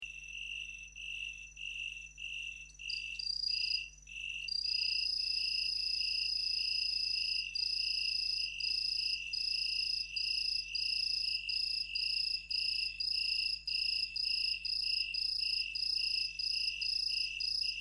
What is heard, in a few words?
Lu pipa solo; cricket; xishuai